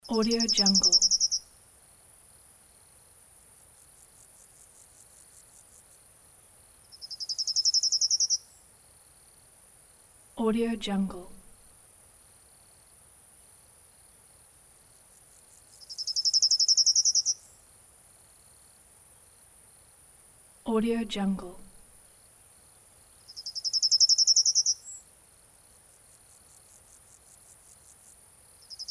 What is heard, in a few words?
summer bird